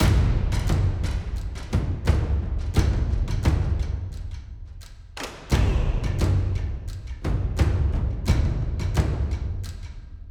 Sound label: Battle Catacombs West; Eon Altar